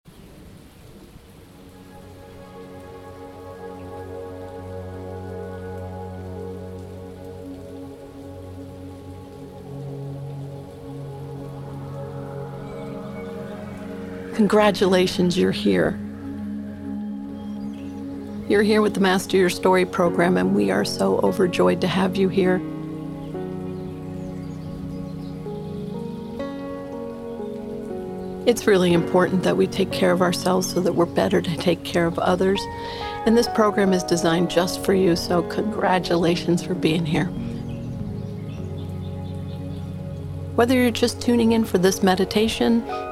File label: Meditation